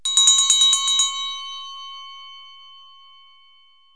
Large Brass Bell; BOOM! - Wild and Wacky Sound Software; City Life; Bells & Buzzers

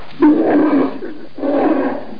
howl; horror

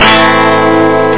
moll, guitar; da capo vol1

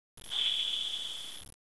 sweep; Gunlok

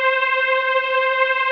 TREM STR; Dark Forces Demo; Dark Forces Instruments

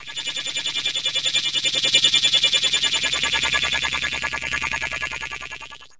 D+B 160; eJay

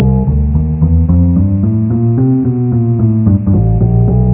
double bass; SoundAndSpeech

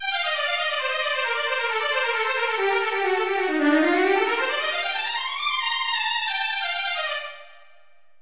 strng

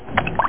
Splash!; Antarctica

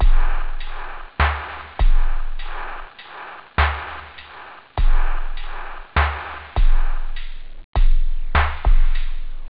rap, instruments wave loop house

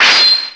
Shakin; Bust; Revista CD Expert 36

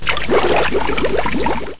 ACID BATH; Carmageddon Demo 1.0

